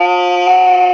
Synthetic Alarm; martyn stonehouse

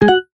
app alert tone

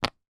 game scrabble game letter tile put on board

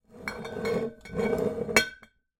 wine bottles x3 slide on table bang together; foley

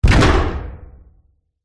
human body slam against metal surface

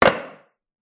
pub wooden bar stool set down on tile floor; field reports

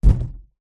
foley balloon inflated impact hit surface vibrate; zapsplat

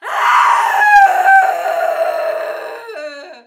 Screams Shouts human female

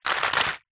foley stones many place into plastic dish; zapsplat

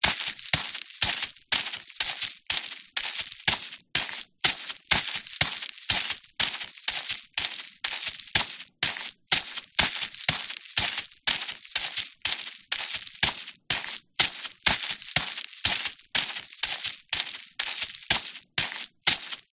footsteps barefoot dirt; foley

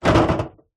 impacts plastic hollow unit impact drop

